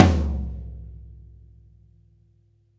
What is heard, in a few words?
Live Tom, Percussion Hits; Zero-G; Soundclash; Acidized Wavs